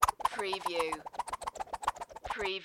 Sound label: Whip Whoosh Multiple; Sound Effects Bible